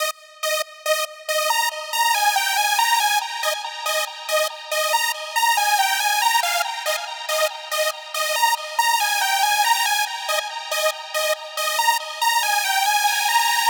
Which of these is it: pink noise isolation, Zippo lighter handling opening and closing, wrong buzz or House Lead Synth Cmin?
House Lead Synth Cmin